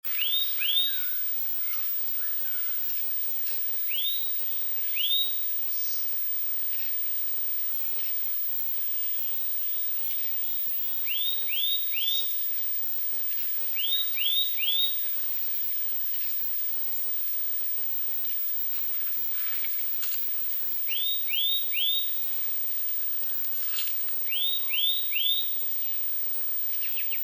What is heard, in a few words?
Myiagra Ruficollis Mimikae, bird species; Broad-Billed Flycatcher